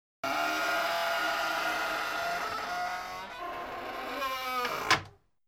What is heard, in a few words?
Door Creaks